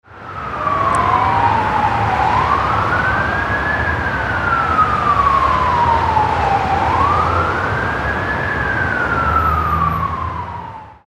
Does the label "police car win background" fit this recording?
Yes